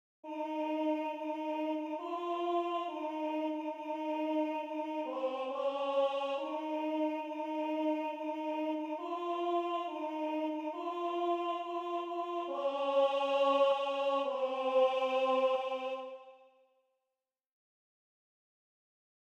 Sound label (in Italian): Dio d amore Tenore; Base tenore